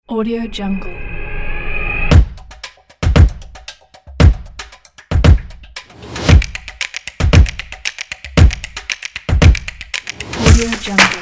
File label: powerful clap percussion